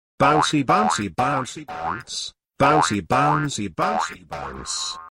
Bouncy Bounce

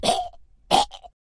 Gag

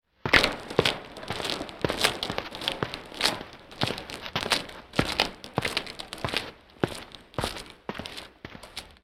hard shoes; daniel simon

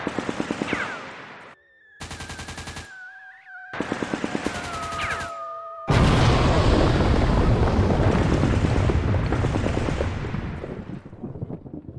Modern Battlefield; Mike Koenig

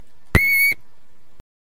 Beep

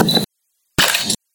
take picture

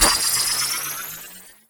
Power-Up

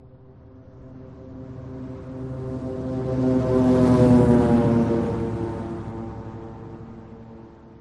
Car Drive By

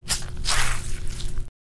Squishing

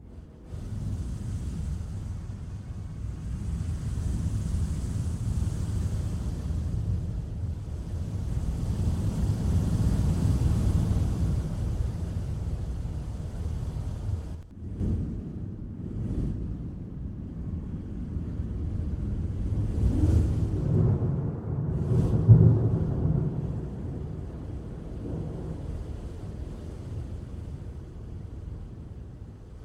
Hurricane

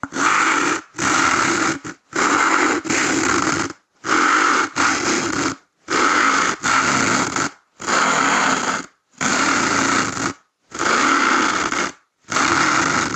Hacksaw

